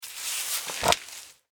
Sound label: Foley Sand Pail Scoop Small Shovel; Playgrounds (Sound Effects:Backgrounds)